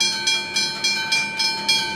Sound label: train crossing; Golden Crayon Sounds